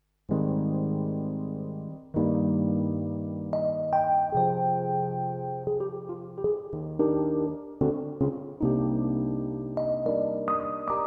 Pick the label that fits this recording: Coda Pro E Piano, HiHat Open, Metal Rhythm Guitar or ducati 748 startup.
Coda Pro E Piano